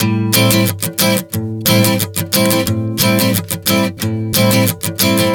Strum 090 Am, One Chord Strumming 090 bpm

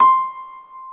C5, GRAND PIANO; InVision Interactive; Lightware VOL-1; Instruments & Percussions

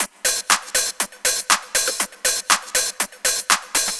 Combo Hat

